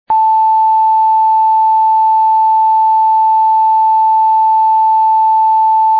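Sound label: A natural 2, recorders; tuning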